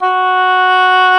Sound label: OBOE F#3; E-MU Formula 4000 Series Vol. 5; Protozoa; Proteus2 Instrmt